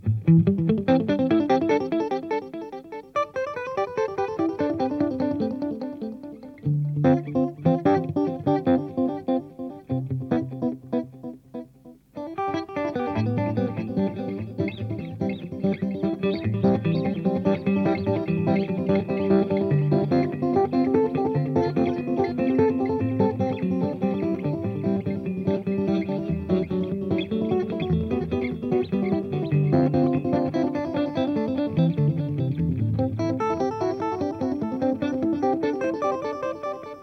stereo 2 amps

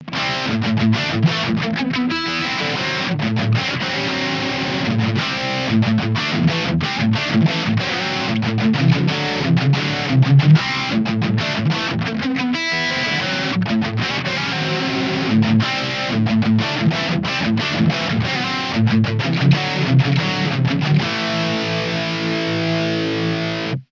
dirt

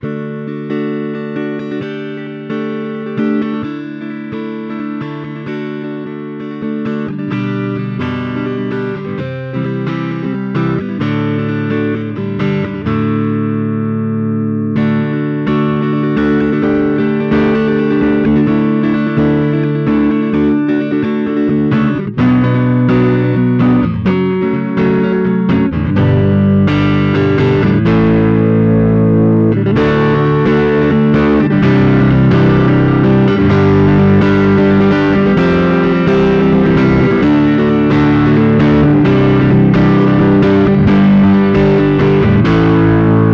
strato tweed hot classic; kaula; gt2

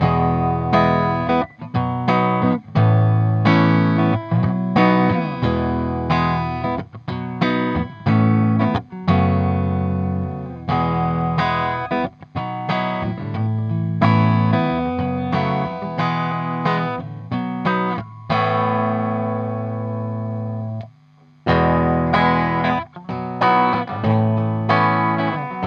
electro harmonix 6v6, clean; paateasteputkivertailu

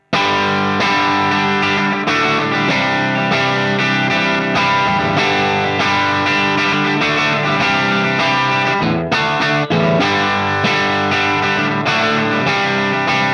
strat sparkledrive

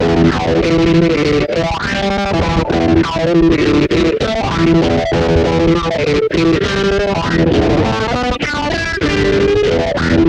strat bridge; coron phaser55

